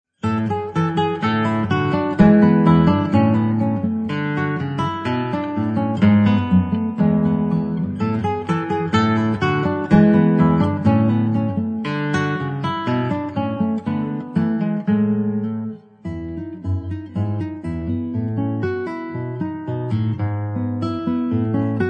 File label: gavotte; antonio brescianello